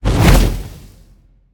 fire activate; smite; god merlin

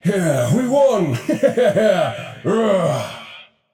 yay; smite; arthur

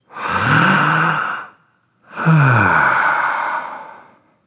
breath; smite; apollo

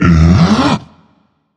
nv breath, vox; smite; voicepack; vulcan

